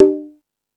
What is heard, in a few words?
Latin Drums, E-MU Pro-Cussion